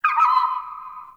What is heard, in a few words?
Mountain Forest Predatory Bird Far